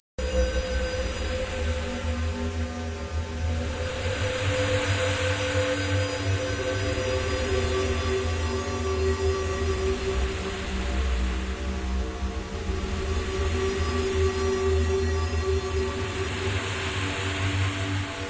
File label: Ambient Music; timothy and the mysterious forest ps4 switch windows gamerip 2019